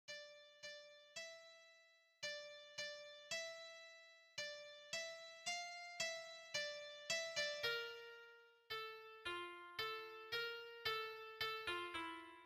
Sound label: ambient japanese music